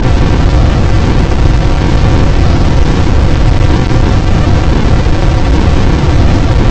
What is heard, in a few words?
storm